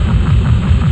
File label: fan; PC World Komputer 1998 May; rrdemo